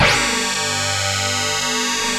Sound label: FM SYNTH; OMI - Universe of Sounds